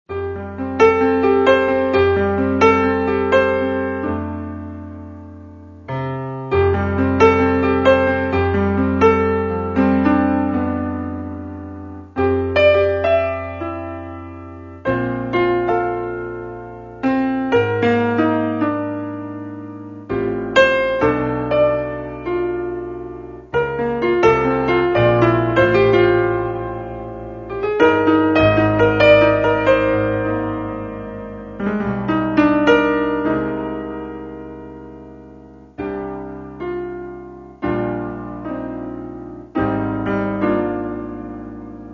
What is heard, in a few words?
blues